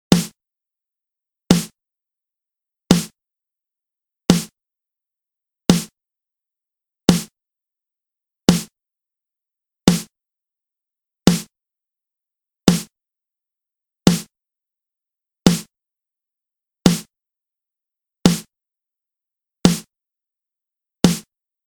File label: HipHop Main SD